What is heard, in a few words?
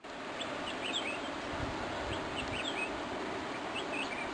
Black crested Bulbul calls; Amar